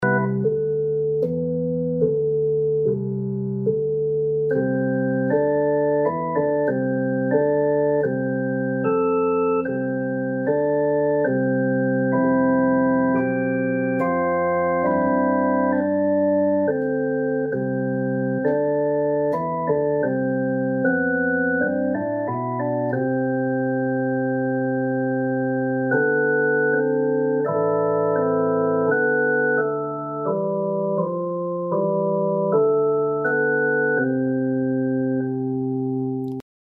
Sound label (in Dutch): improvisaties orgel